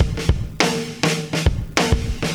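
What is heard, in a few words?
102 bpm, beat loops; Zero-G; Monster Beats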